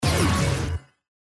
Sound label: Burst